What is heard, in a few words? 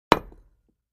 Patio Block Hit Sledg, concrete & brick